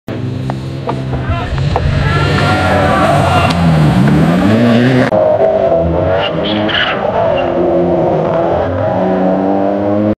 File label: Car racing